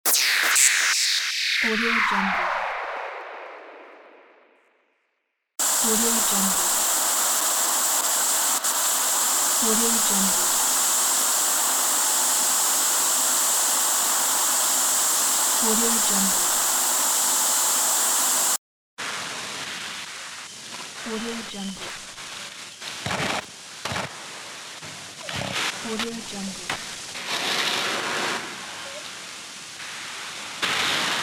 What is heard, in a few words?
radio sound pack